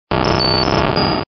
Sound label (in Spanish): Grito; Magneton